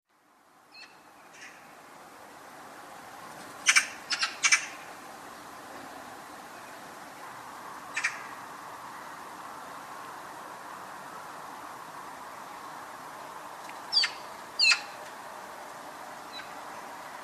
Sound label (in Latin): Pica pica; SETE